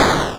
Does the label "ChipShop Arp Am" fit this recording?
No